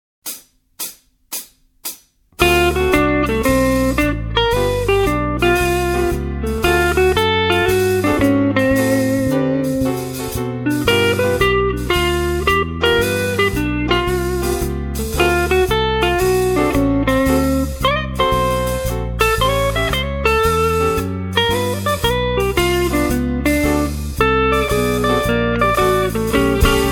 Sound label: blues jazz